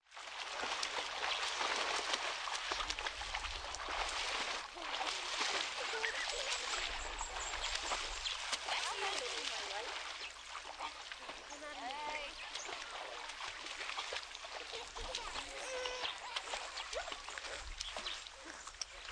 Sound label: Ambience Lake, Lake, Indonesia, 4-6 children, swimming, outdoor, splashing, kids playing; kids playing in the water, chattering, yelling, some coughing, nature sounds